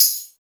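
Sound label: percussion, Drum & Bass, 160 BPM; Ueberschall; Drum & Bass Flash Trackz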